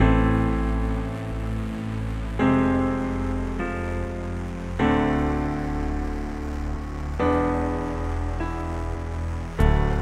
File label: Level Caves (Loop); Beholgar